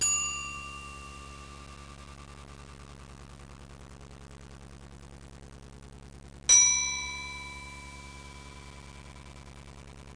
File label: bell